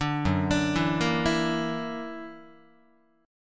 Gb Augmented 7th Gb down Guitar 4A,4E,5A,5D,5G sharp,6C sharp; Gb+7 arpeggiated